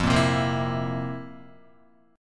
F Major 7th Suspended 4th F, Guitar; strummed

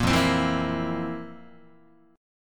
F Major 7th Suspended 4th F, Guitar; FM7sus4 strummed; JGuitar

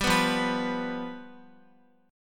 F Suspended 2nd Flat 5th, Guitar; Fsus2b5 strummed

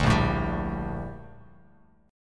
Bb Major 13th Bb Bass Standard; strummed; JGuitar

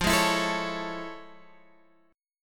A Major 7th F; AM7/F; strummed

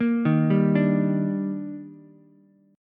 Eb Major 7th, Ukulele; EbM7; arpeggiated; JGuitar

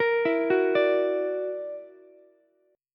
Gb Augmented 7th Gb down Ukulele; Gb+7 arpeggiated

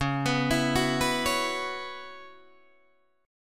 C sharp Minor 11th C sharp, Guitar DADGAD

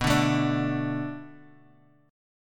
B Minor 7th B, Guitar; Bm7 strummed

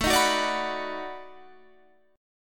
B 7th Sharp 9th, guitar

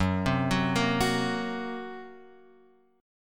Gb Major Flat 5th, Guitar Open F; arpeggiated; x,1,0,1,1,1; JGuitar

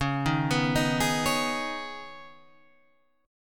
C sharp 7th Suspended 2nd

